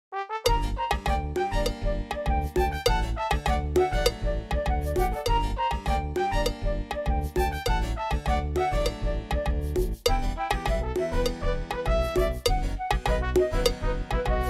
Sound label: Flute; RuneScape